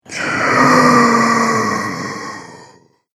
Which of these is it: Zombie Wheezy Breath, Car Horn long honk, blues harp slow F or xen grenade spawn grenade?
Zombie Wheezy Breath